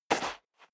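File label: step dress boots sand single right, footsteps; schritte; menschen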